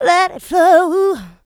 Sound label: Female Vocals; Houseworx